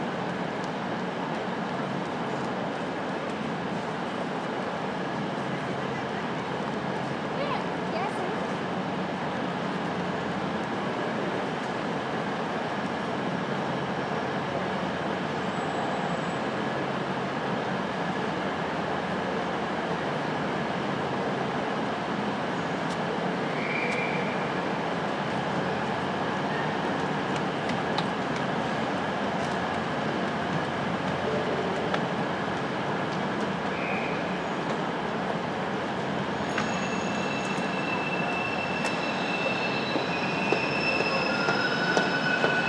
60 seconds of manchester victoria train station ambience